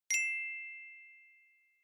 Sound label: Glocken (Multi)